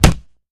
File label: Impact Heavy Rubber